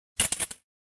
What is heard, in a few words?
lightsaber glitch